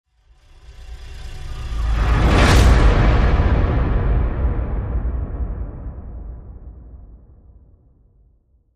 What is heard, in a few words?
Desert March Dark Hit